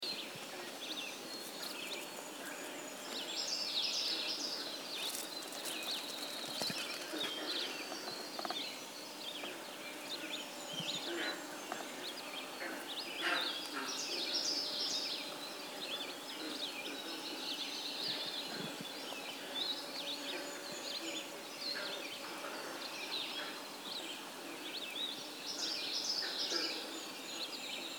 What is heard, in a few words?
AM Bird Chorus West Pond